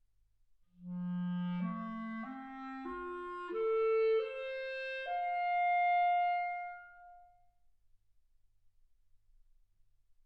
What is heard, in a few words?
clarinet